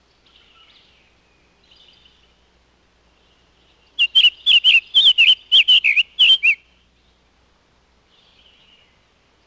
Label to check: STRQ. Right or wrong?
Wrong